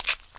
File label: Pickup; Pinguinos Biri Game; Android